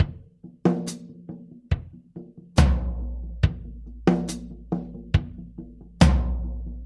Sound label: Earth Loop, Drum Tools, Laid Back